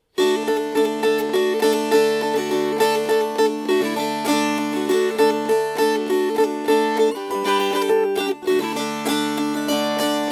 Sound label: Fast